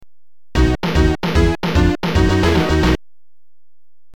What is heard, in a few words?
lose a life; fire & forget 2; amiga